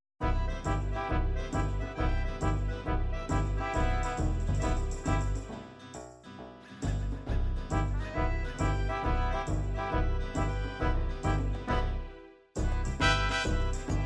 tarantela tarantella, midi

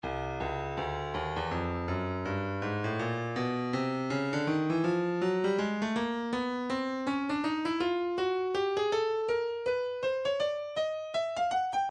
breakbeat midi 081 bpm; neck b; Stylus Breakbeat Midi Files

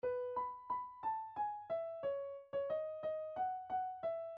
telefon, midi

